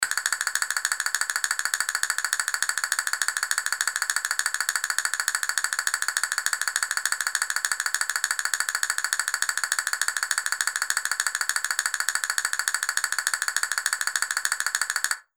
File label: chattering teeth